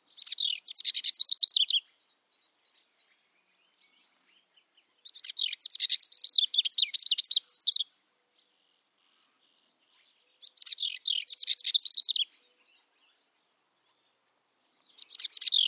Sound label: House Wren